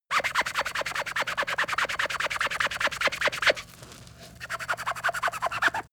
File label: sponge squeak fast